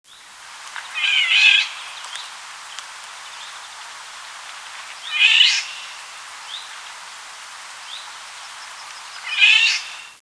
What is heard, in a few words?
Oriolus oriolus